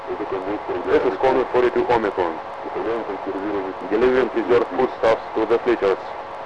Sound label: static